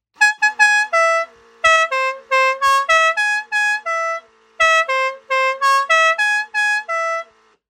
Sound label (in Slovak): fanfara 5 tonova s kompresorom melodia